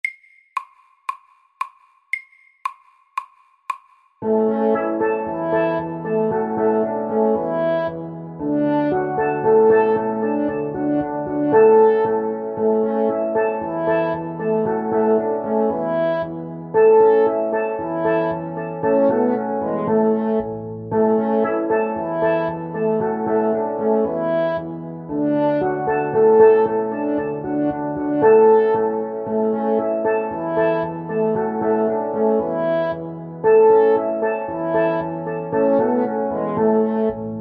Steel drum band MIDDELS

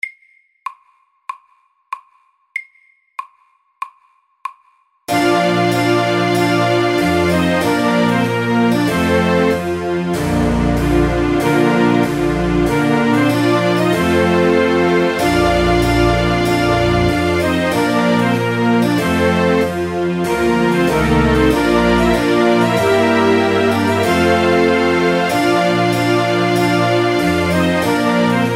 Trumpet tune SAKTE

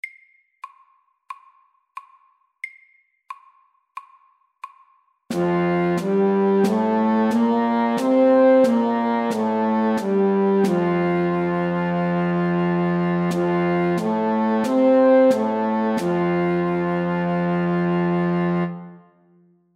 F dur natura

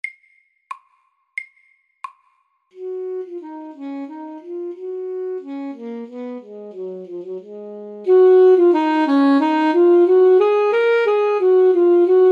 Dynamikk etyde FORT

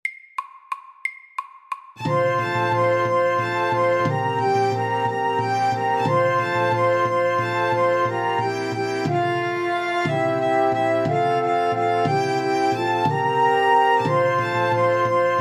Irsk melodi FORT

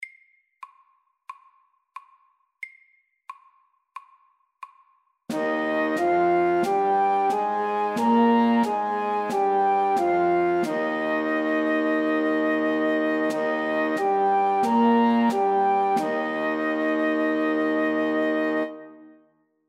Ess dur natura

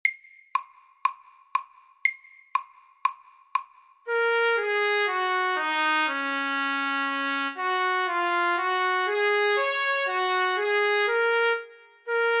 Lyrisk etyde i Ass dur FORT

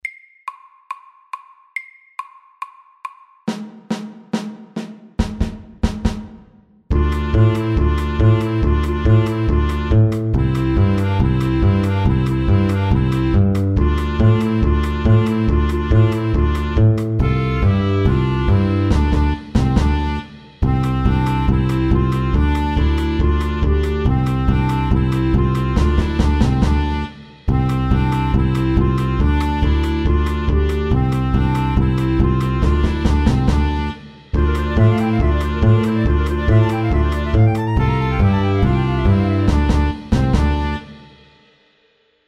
Balkan stomp FORT

